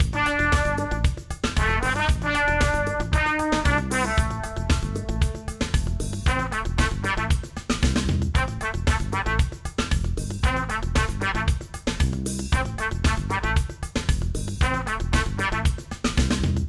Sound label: Relaxing Retro